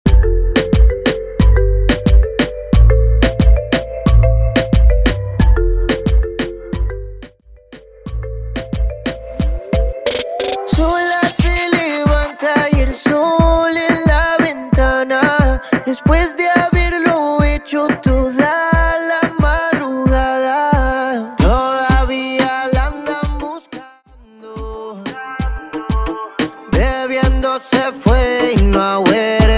percapella intro outro 90 BPM

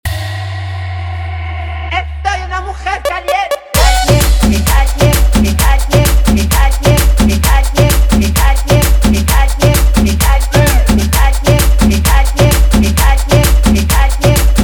intro outro 130 bpm

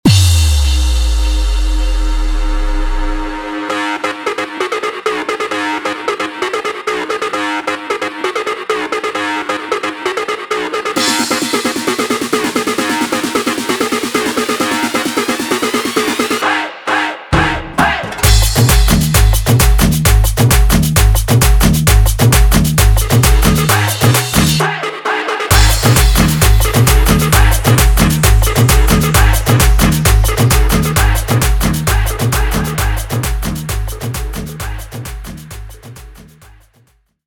guaracha remix 132 bpm; Reggaeton dj remixes

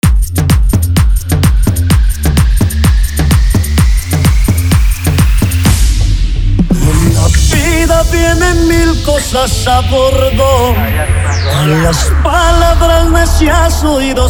Intro Outro 128 BPM

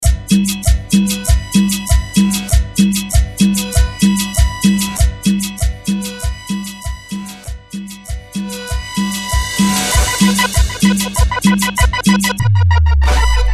Intro Outro Break 97BPM

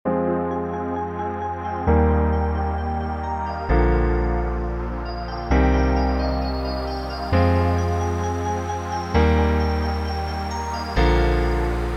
intro outro 130 bpm

